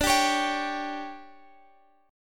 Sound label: D Major 7th Suspended 4th, chord; DM7sus4 strummed